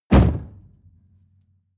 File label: pickup door close